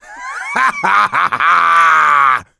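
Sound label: laughter, male; survivor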